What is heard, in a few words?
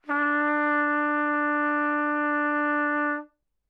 trumpet original